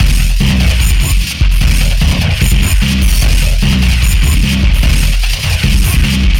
Jungle Loop; Sonic Foundry (Sony Creative Software); Esoterik Beatz

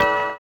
orient, bizarre guitar; Spectrasonics

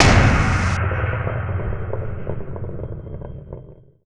SLAMMIN; COMPLEXHITS; Rarefaction; Poke in The Ear With A Sharp Stick

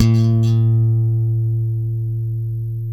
FUNK, Bass; Spectrasonics; Bass Legends

